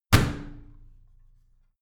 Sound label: washing machine slam shut